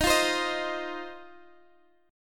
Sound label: C Minor Double Flat 5th, D sharp, chord